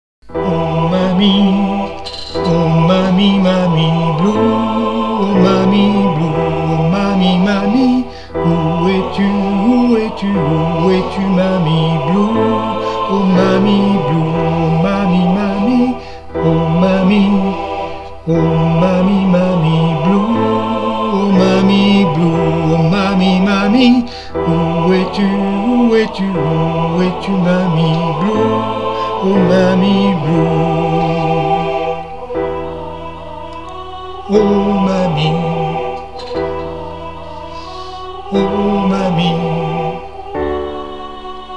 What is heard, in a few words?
Tenors